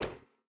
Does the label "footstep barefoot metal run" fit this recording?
Yes